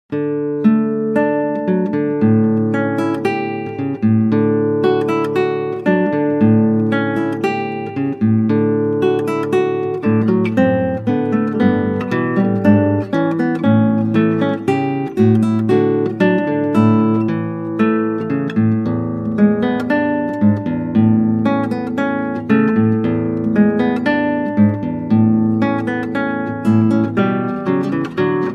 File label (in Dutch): Klassieke Gitaar; Bach 50 Solos